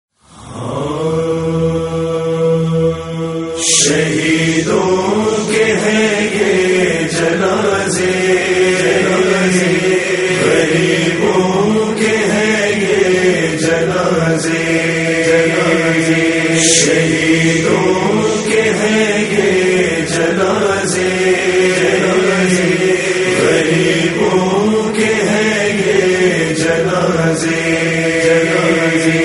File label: Nohay, Noha; 72 TABOOT; 72 Shaheed; BAHATTAR TABOOT; Tadfeen e Shohda e Karbala